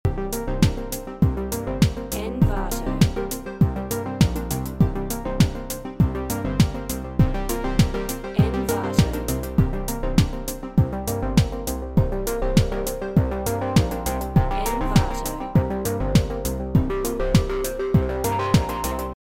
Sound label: cyber loop; vaisnava